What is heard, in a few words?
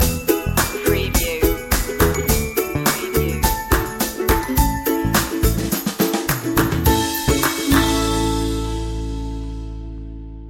playtime stinger